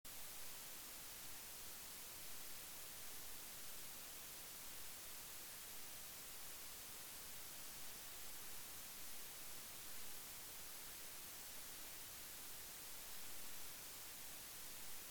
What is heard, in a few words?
keypad